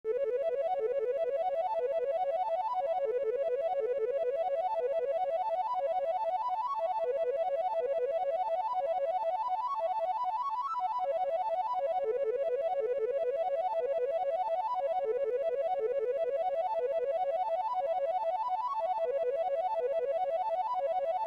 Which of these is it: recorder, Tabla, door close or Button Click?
recorder